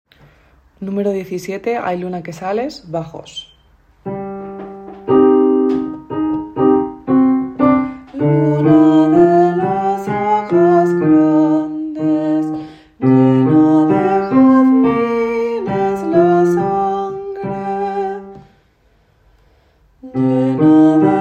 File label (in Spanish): baj; luna que sales; Bodas de Sangre